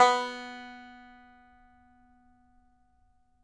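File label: banjo B3 open, banjo notes; Sampleheads - Whole Lotta Country 1 & 2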